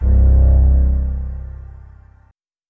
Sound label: BASS ONE SHOT; 808s